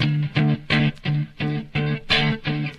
guitar, Loops

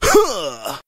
"huh", voice

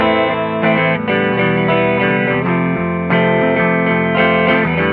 guitar

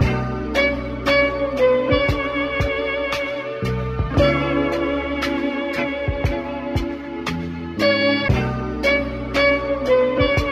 horns, phonky loops

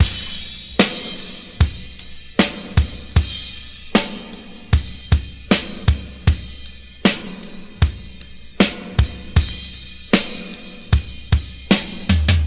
Drum loops, Boombap

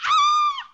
scream female; ooh ahh

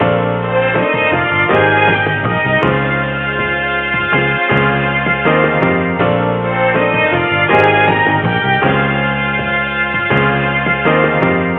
piano strings extra notes; Loops; Phonky

